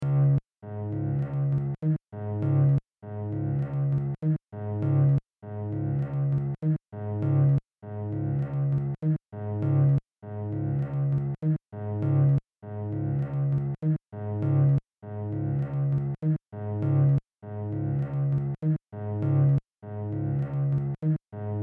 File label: C#m100 acoustic